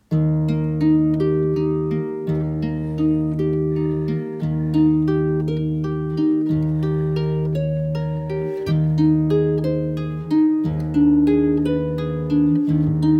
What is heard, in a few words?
ave maria schubert harp voice